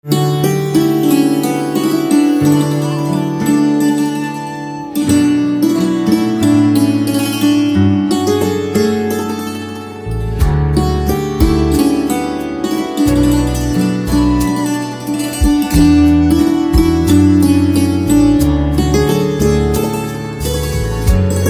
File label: Santoor